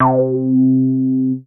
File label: C3, BASS; Q Up Arts; Dream Experience; BASS BANK 15